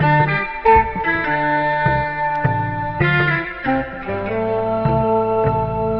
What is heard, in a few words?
keys, jazz loops